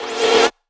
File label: Synth Shot; Vengeance Samples